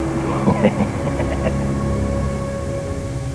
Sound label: laugh